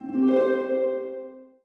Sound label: guardian; weapons; dota; omniknight